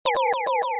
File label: zap; TamTamMicro